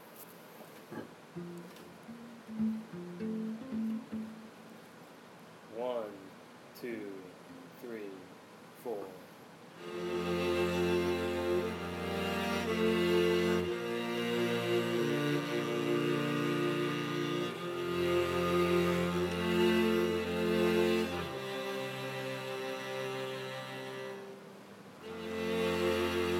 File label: cello practice